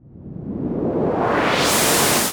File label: Air FX, Filtered Noise; noise hiss crackle